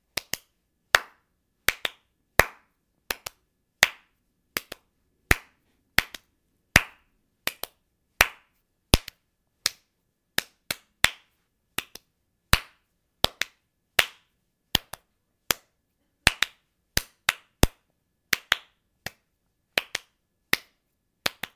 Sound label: Clapping Beat